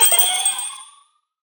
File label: idle with item, allay; mob; minecraft